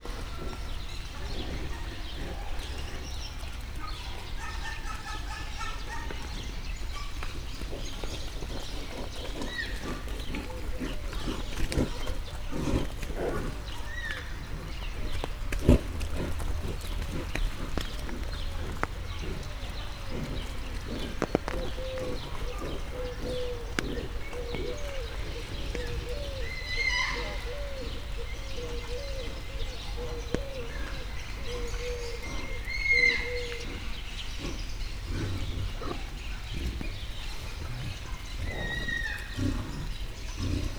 lihegnek, szaladoznak, eső, jak; állathangok; nyíregyházi zoo 2010

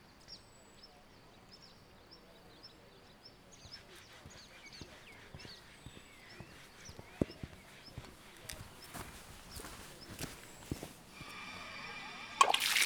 messzebbről érkezés arcmosás és távozás; Szögliget; artézi kút

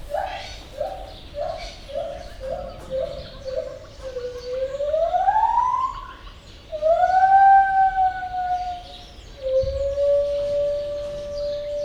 majomházból rövid intenzív, fehérkezű gibbon; jaszberenyi zoo 2016

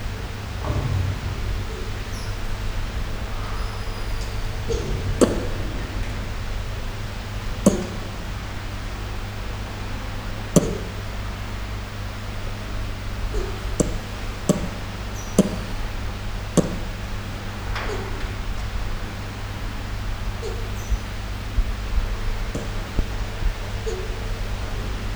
gyurusfarku maki katta; állathangok; nyíregyházi zoo 2010

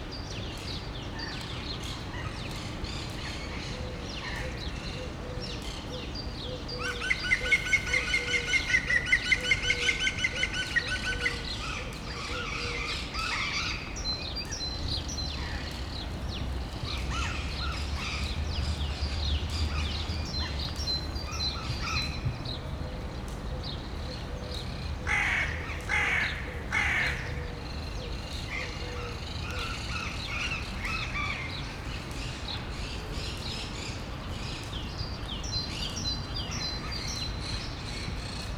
zöldküllő, varjú, villanypásztor, szürke varjú; debreceni zoo 2012

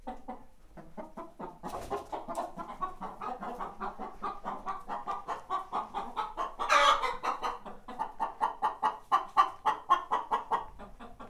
ólban ketten kotkodálnak, magyar tyúk; parasztudvar

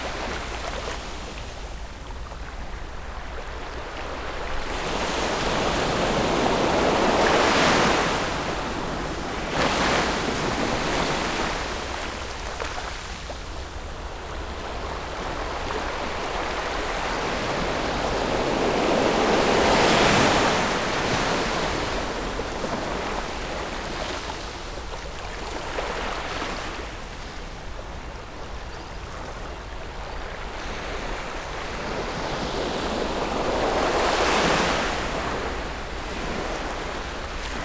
közelről erősebb hullám

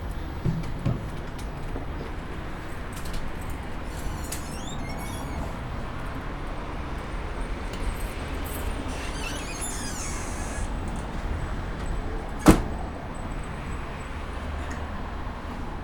14-es, lehel tertol indulo, villamos

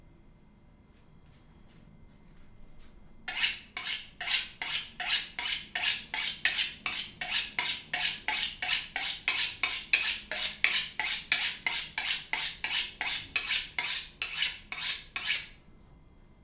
keselezes; angyalfold

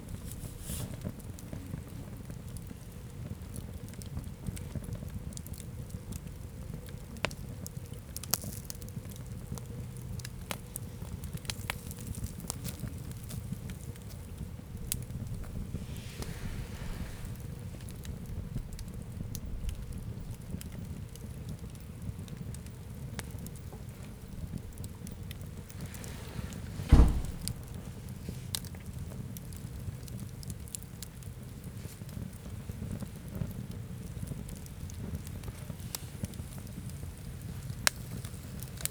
parázs, tábortűz; vizhaz; cardioid

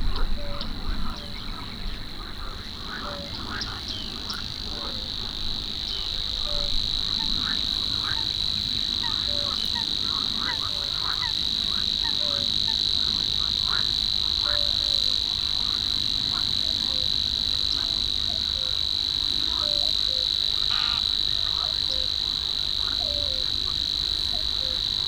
változó hangerővel tücsökmadár elrepülő vadkacsa hortobágyi halastavak; allathangok; termeszetben

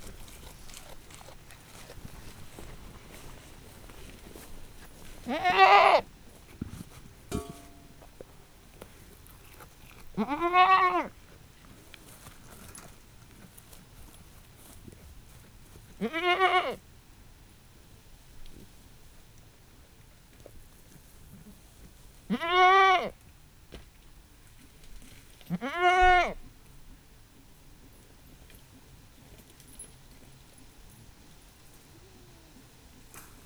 kecske udvar; állathangok; paraszt udvar; házi kecske

